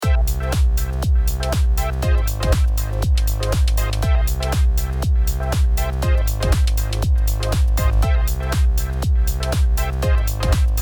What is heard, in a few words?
cheesy techno